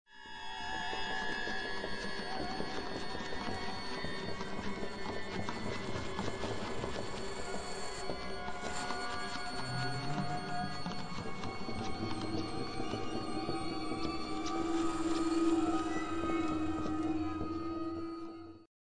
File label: slap